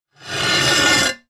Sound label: foley mirror no frame drag on table; sound effects one